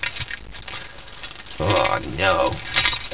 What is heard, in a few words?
Keys